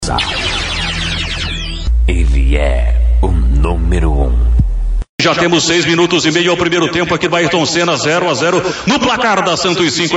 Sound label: BPM